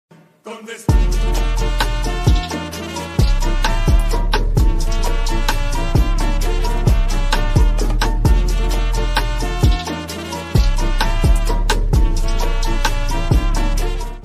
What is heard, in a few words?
Mexican ringtone